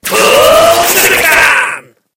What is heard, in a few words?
Boom shurikan